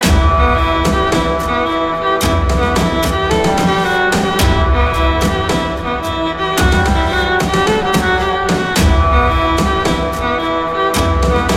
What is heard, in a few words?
evil sad barren; Adventure Xpress